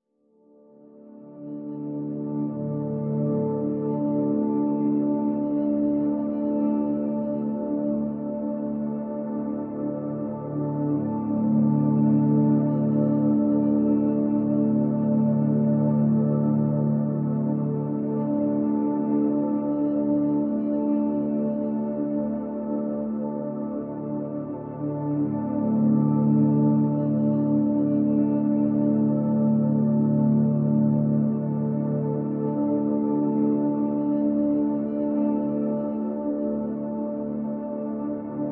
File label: Pads D